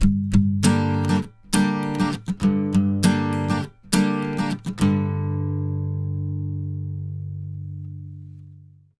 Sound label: 100 MIN CHD G, Acoustic Guitar Loops; USB Soundscan vol.04; AKAI